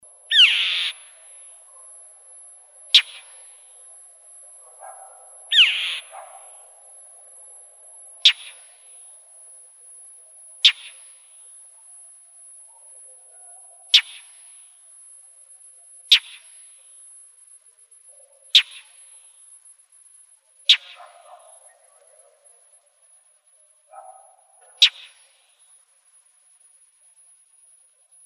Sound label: CALLS, Mimids; AZFO; deviche